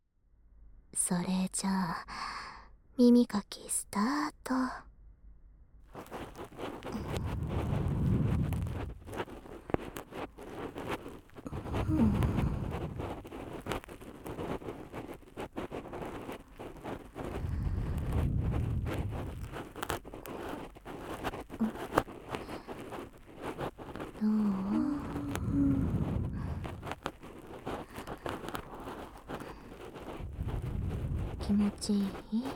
安眠用耳かき部分抜粋, ASMR

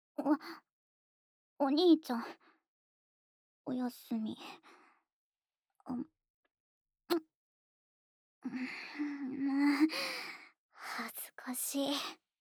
おやすみのチュー お兄ちゃんver; ASMR

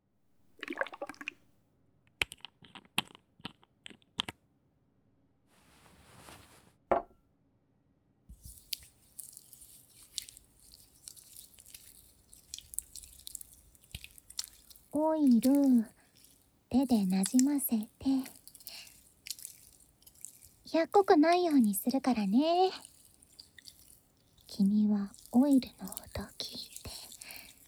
お耳 オイルマッサージ

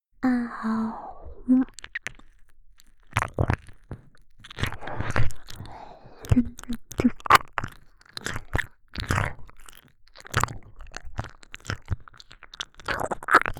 耳舐めループ(妹), ASMR; 触手音 フェラ音 耳舐め音オンリートラック

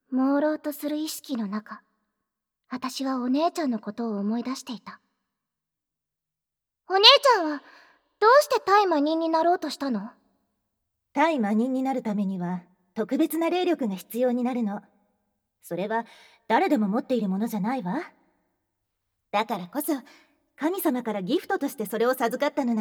乳首責め・耳舐め・乳首舐め・クリフェラ; 鎖で繋がれながら、姉からの乳首責め・耳舐め・乳首舐め・クリフェラ; 囚われの退魔人ギャルJKアズサ

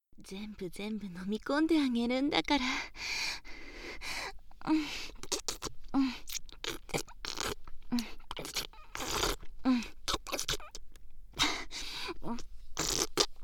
フェラ ラストスパート＆射精

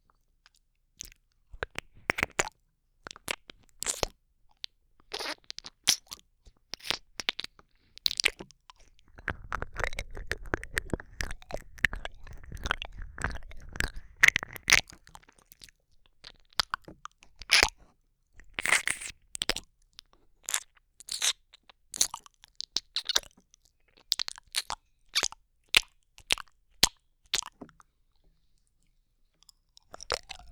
キスをしながら耳舐め, ASMR

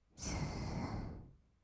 す, ブレス, 吐く音, kawaii voice; ASMR; 1200 kawaii voice!!

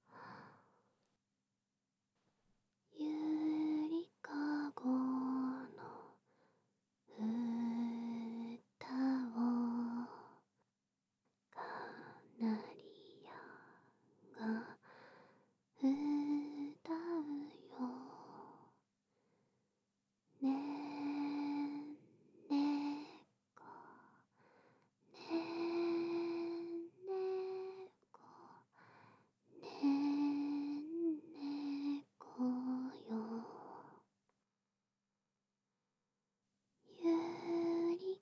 子守唄

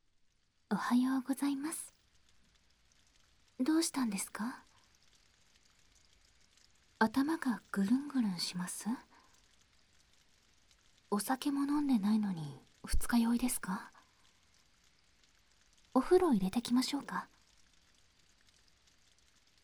脳ほぐし耳マッサージ（BGMなし）, ASMR

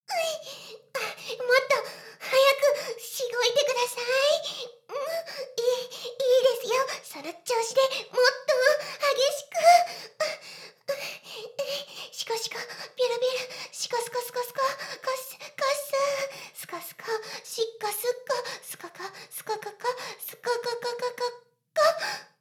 シコシコ音声; ダメなメイド

